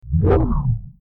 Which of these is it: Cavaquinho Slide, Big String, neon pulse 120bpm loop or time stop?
time stop